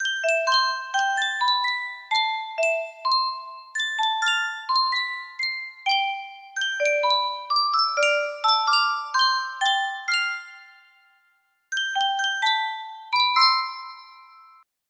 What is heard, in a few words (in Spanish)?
Yunsheng caja de musica, music box melody